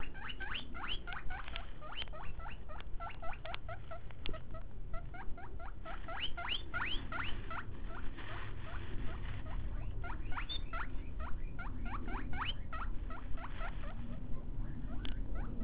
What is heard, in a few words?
wheeking, guinea pig